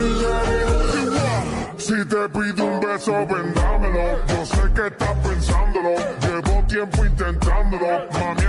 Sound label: English Remix Ringtone